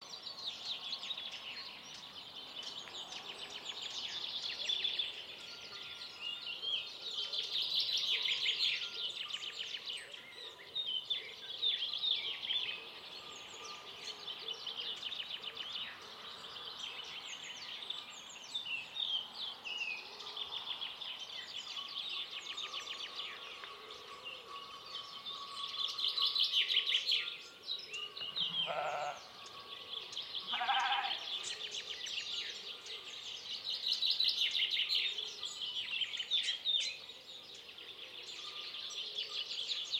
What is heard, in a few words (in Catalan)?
Biofonia trenc dalba Cases menut